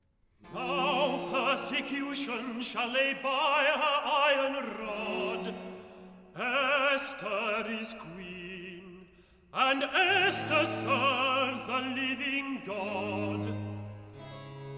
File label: Recitative; George Frideric Handel; Esther 1718 version; Purim; 'Now Persecution Shall Lay By Her Iron Rod'